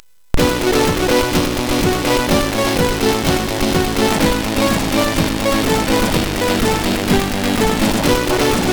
Sampl Checka, MOS6581R4; sid; Knusprig

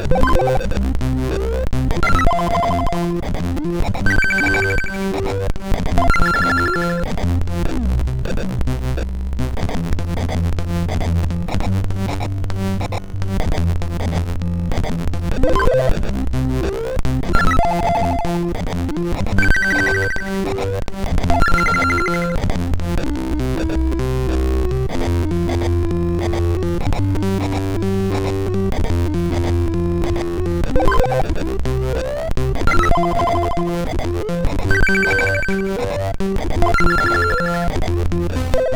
A Happy Gametune, MOS6581R2

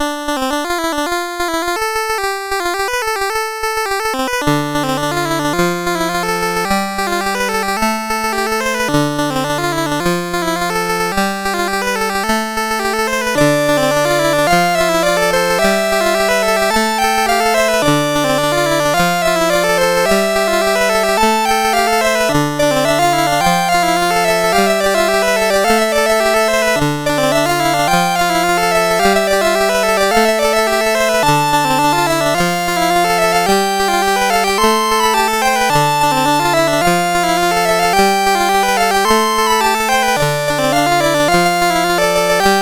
Baila la guerra, sid CSG8580R5

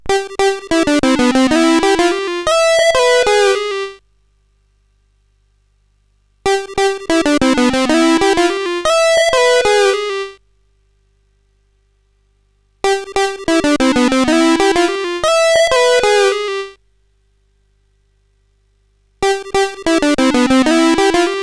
Blues, CSG8580R5; Spike Super Blues Experience

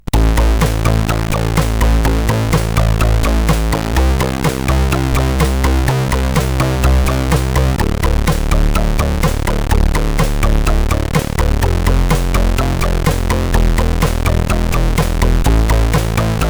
Oehh, CSG8580R5

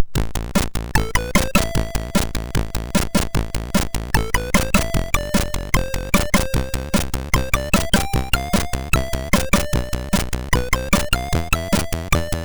sid, MOS6581R2

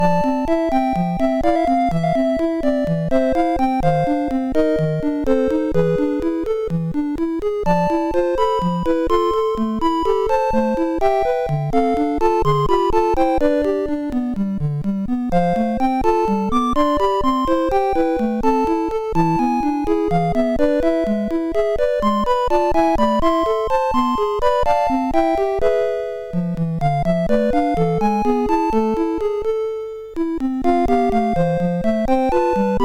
overdrive sid MOS6581R3

